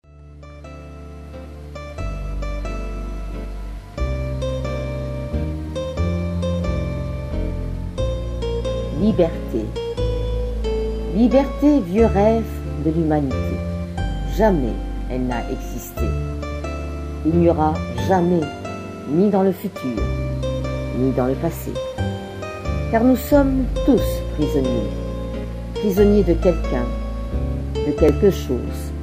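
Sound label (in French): moment musical pour vibraphone accompagnement électro acoustique; liberte poem yvonne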